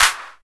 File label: clap single hit f sharp; single hits one shots